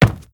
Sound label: Soft Wood Run; moul